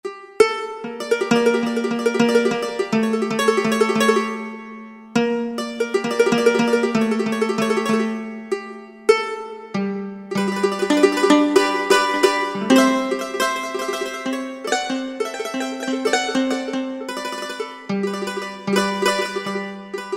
Cavaquinho; World; Roland SR-JV80-05

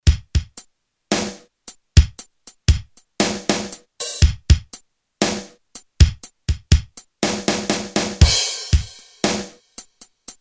Drum Room; t.c. electronics M300

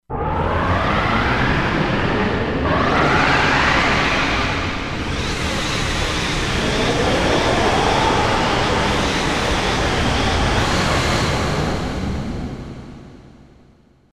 SFX, Korg MSC-16S; Environment

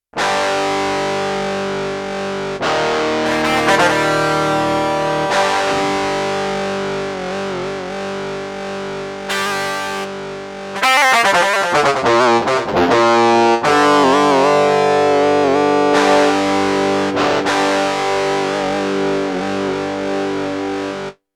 Power Guitar; Rock Performance 2

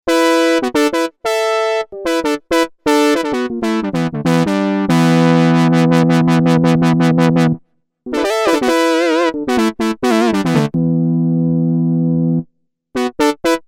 5th brass, Korg DW-8000; Factory Patches

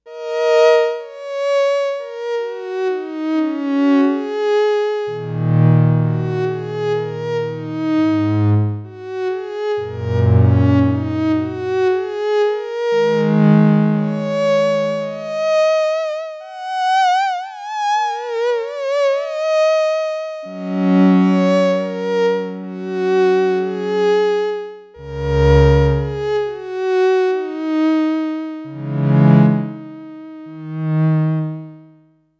Strings, Sequential Circuits Six-Trak; 32 Strings (brugel) 4; Factory Programs

